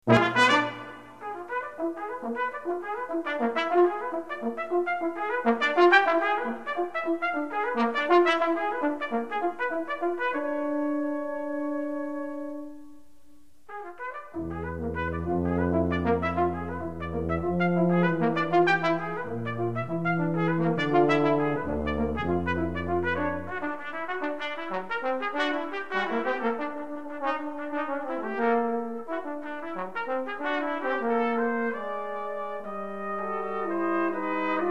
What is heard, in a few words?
Brass Quintet Prestissimo; Hocket